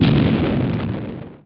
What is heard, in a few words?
explode; BlitzNext; wing ring